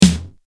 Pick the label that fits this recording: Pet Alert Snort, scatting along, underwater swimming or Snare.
Snare